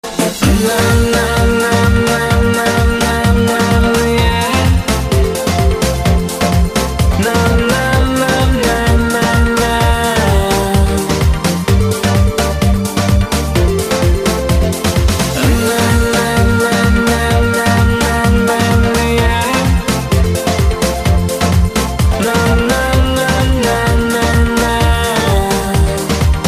reggaeton house mix

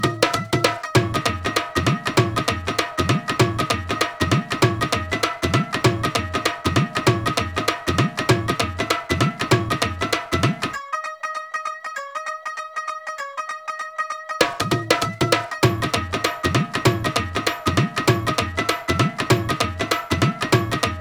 karaoke

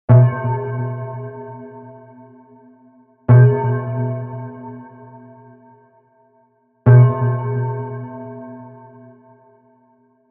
big bell big bell

